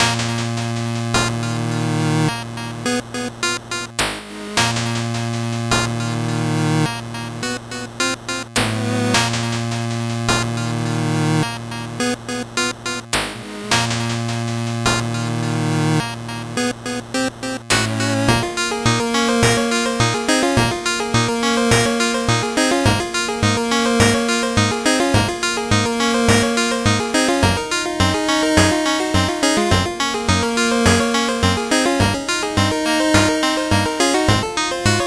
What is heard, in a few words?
8bit bgm normal